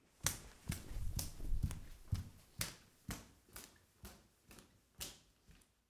Bare Feet On Linoleum Walking Away Medium Fast Foley